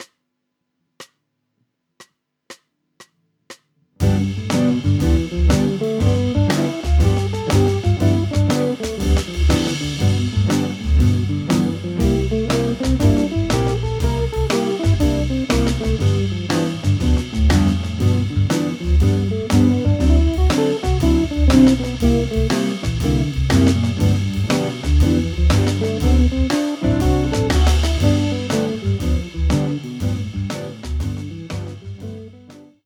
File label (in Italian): Blues in G; Sovrapposizioni